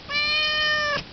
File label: meow